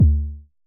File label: Basic Kick D2, Dirty Kicks, Vermona Kick Lancet; LookIMadeAThing Kicks